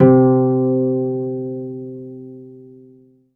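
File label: HARP D3 SUS; ILIO; Synclavier Strings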